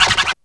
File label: SCRATCH, VINYL; Back In Time Records; Big Bang